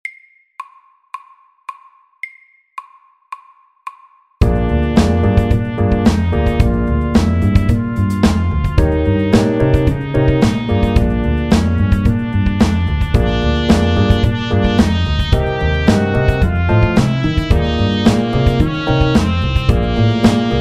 Kromatisk blues FORT

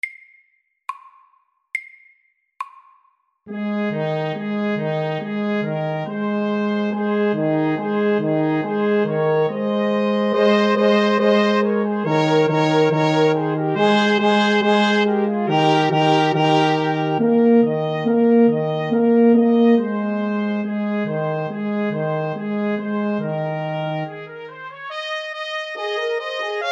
Fanfare SAKTE

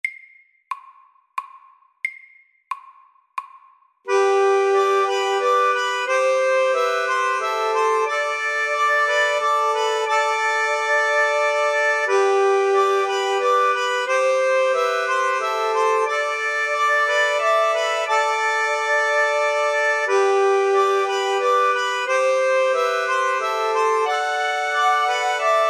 Musette MIDDELS

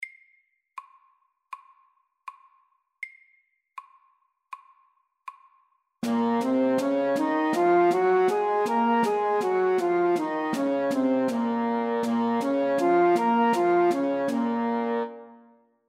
B moll natura MIDDELS

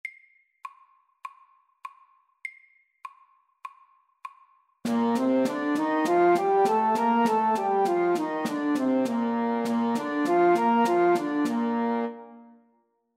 B dur natura FORT

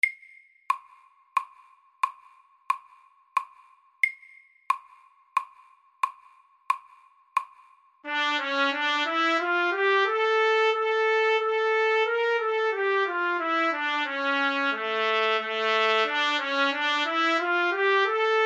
Etyde Andante SAKTE

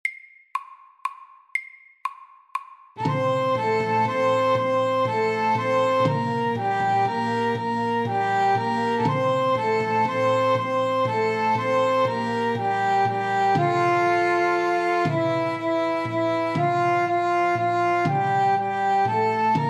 Irsk melodi MIDDELS

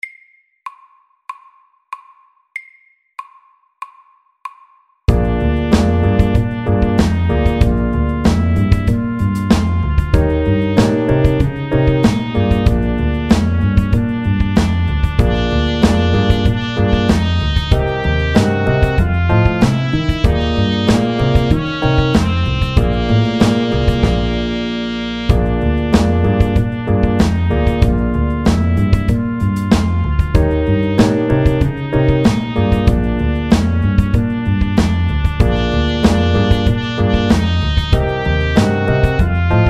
Kromatisk blues MIDDELS

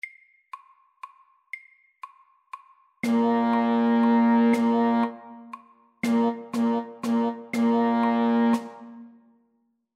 Rytme 3 slag i takten Pa slaget FORT

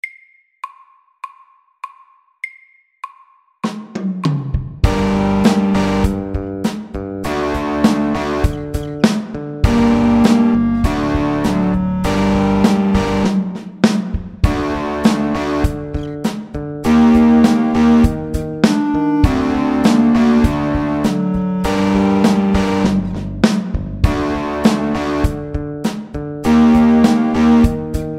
Elektrisk rock MEDIUM 100

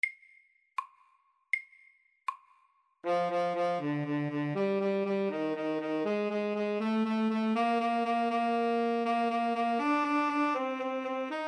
Etyde MIDDELS

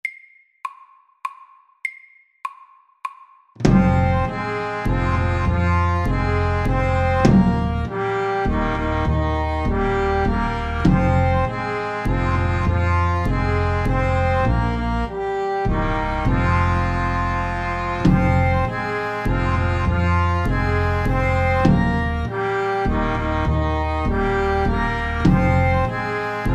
Sjanti SLOW 100

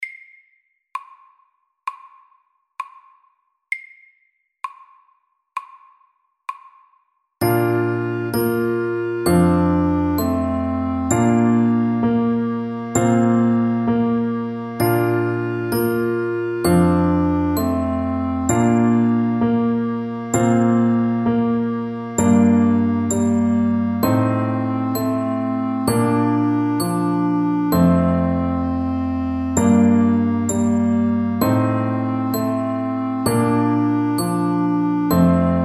Vuggesang SLOW 65

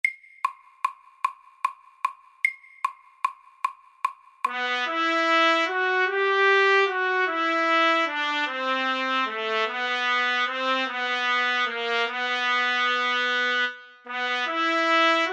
Etyde i Fiss moll og Fiss dur MIDDELS

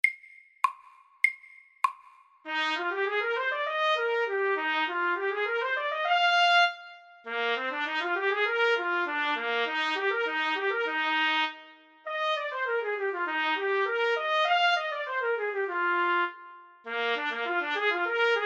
Etyde i F dur SAKTE

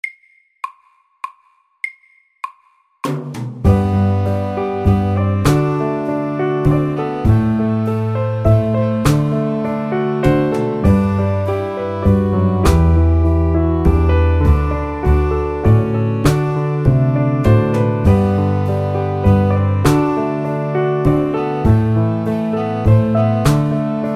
Soft rock FORT

